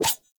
Dagger Whoosh Heavy; Weapons; Enemy; Daggers; Sinecure Dagger

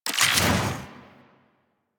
Infuse Fire UI; Warframe; Warframes; Alchemist

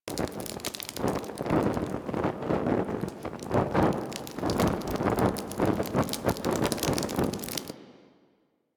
Temple Pillar Fire Loop; Warframe; Ambience; Orokin Derelict; Red Veil Temple

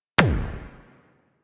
Arch Gun Primary Beam Punch 3D, Weapons; Warframe; Archwing; Hildryn

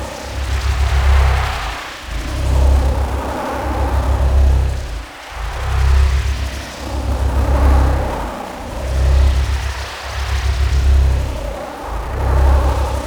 Laser Ring Loop; Warframe; Ambience; Rail Jack; Pluto Charon Region

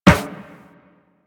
Run Metal Perforated, Footsteps

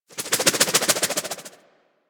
Glaive Idle; Warframe

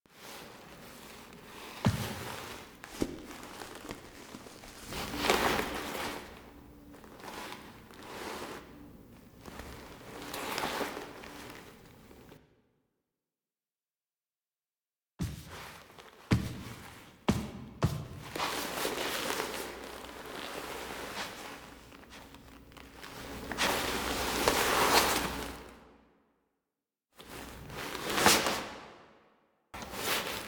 Operator Amp Foley; Warframe; Eidolon Plains

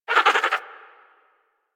Bird Captured Idle; Warframe; Hunting Critters; Sawgaw

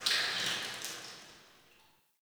Under City Alley Water Drips

